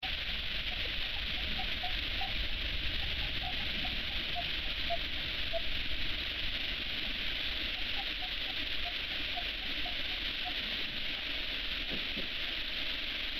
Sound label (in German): kleiner abendsegler; Mag. Peter Holub